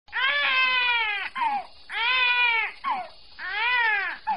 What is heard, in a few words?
baby crying meme sound